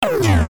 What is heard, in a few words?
Futuristic Gun Sound; Bullet and Gun; FXHome